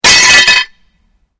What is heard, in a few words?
GLASS SHARD SMASH; Material components for constructing more complex sounds; Materials